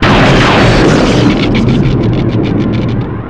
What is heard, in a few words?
star fire